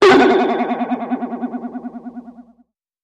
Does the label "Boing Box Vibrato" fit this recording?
Yes